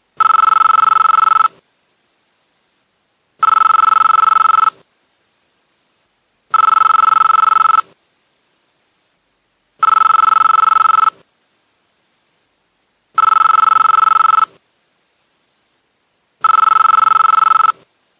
cool ring ringtone

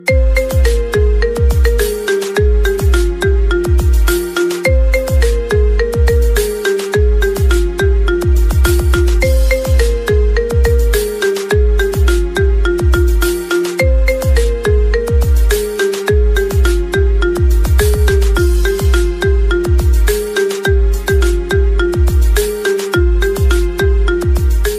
best instrumental ringtone, bollywood

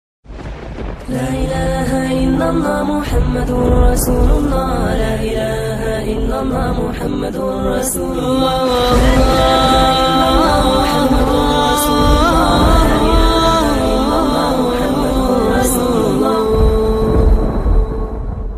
ringtone islam

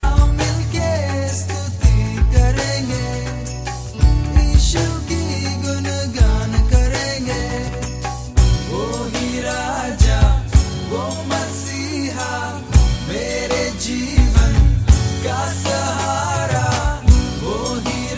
hindi gospel ringtone

